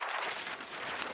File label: page turn; princess